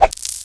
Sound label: skill salt